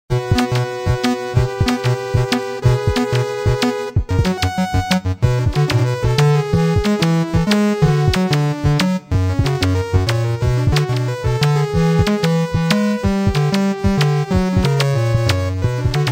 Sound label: 8-Bit Dance